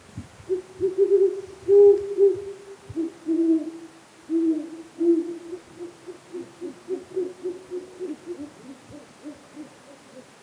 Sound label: Great Horned Owl Bubo virginianus